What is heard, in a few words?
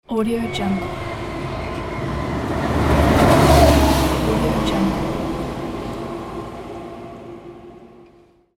bus passby